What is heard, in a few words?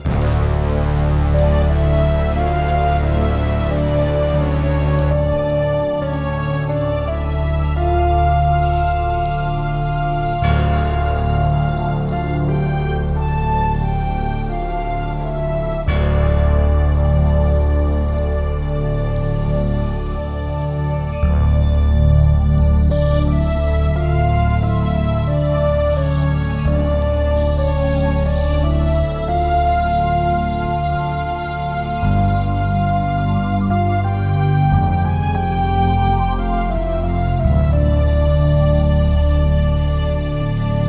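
Theme (Instrumental)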